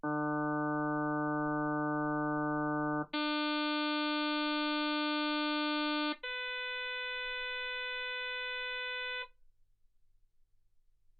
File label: harm sinus 48kHz